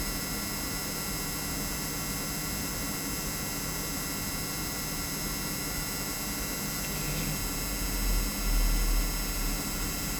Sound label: Gaming Fan Stop